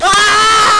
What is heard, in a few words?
Scream